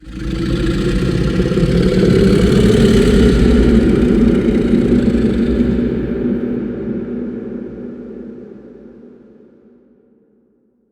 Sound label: titan groan